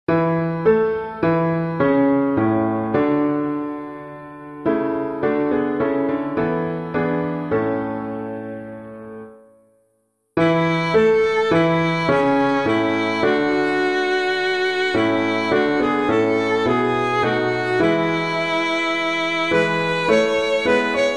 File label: To Christ the Prince of Peace, FESTAL SONG, piano, hymn